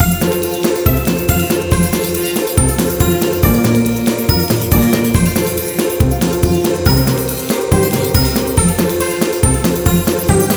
Balloon Pop Music; Spanish

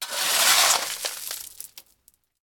Shovel Gravel; Prison Architect

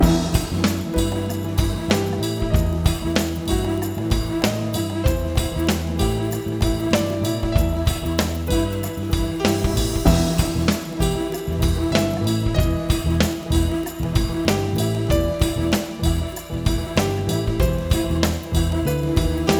Loop

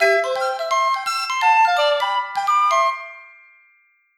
Level Complete; Gabbuchi; ps4 switch windows

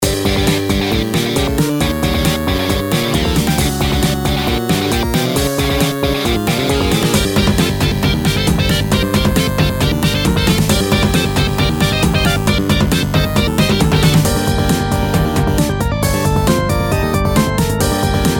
Music Sewers Battle; Adventure to Fate: Quest to the Future

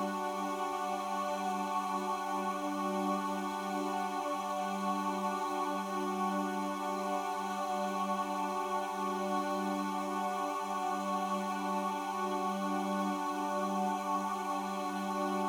Low Health Loop; void bastards macos ps4 switch windows xbox one gamerip 2019

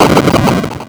Stamp; BOMBERGIRL RAINBOW; Arcade

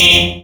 ui, plus

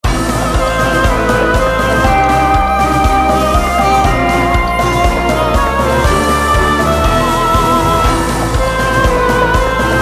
Music Game General event

